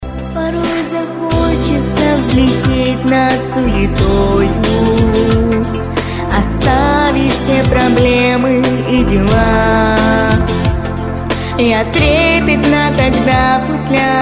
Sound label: Skripka plus